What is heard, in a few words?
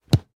reel bounce; superstriker